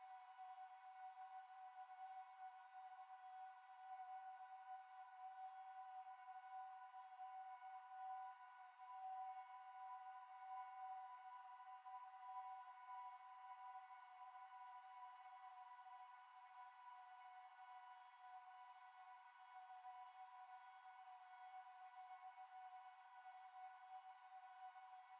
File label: submenu loop